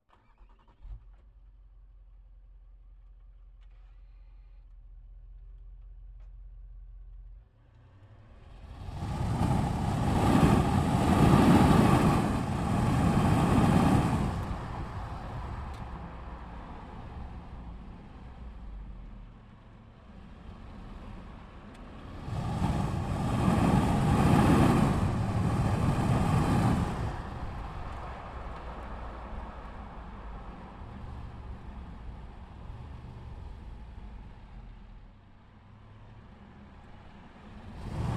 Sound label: Range Rover SDV8 - ONBRD - CIRCLING - Start Drive Stop Off - EXHAUST - Right; Pole Position; Range Rover 4.4 SDV8